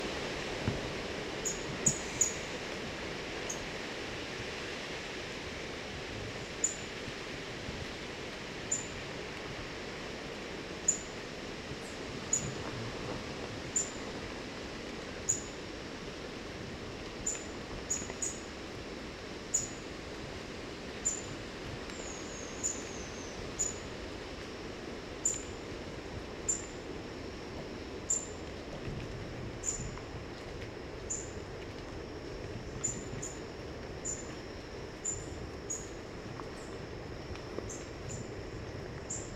White browed foliage gleaner; پرنده برگ چین سفیدابرو

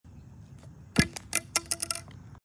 plastic pipe

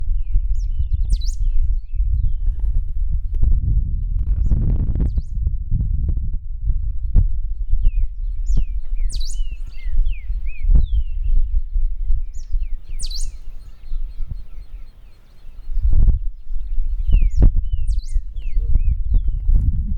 Serra finch

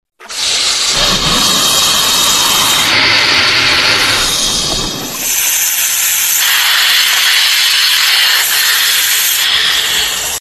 anaconda

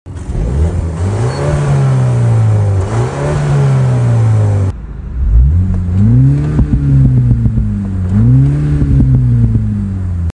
Mini John Cooper; مینی جان کوپر

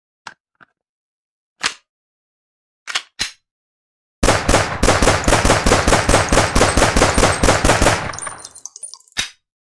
Glock, تفنگ

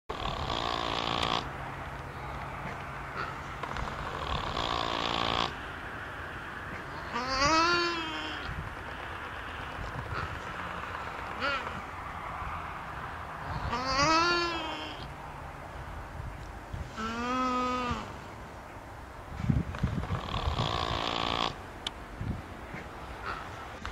Nutria; نوتریا

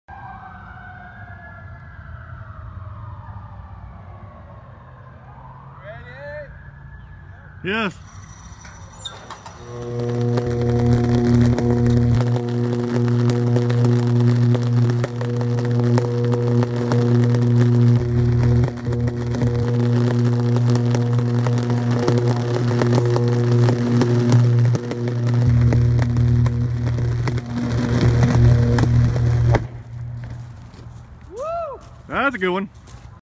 High voltage power

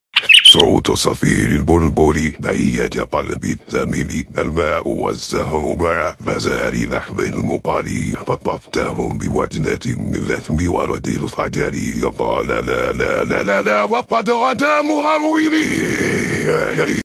soot safir al bolboli, صوت صفیر البلبلی

